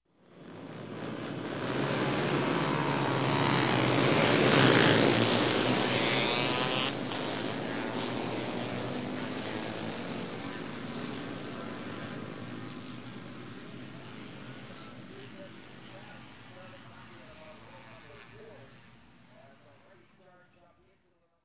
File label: Motorcycle, Race Lightweight Vintage, Start Of; Sound Ideas; Series 2000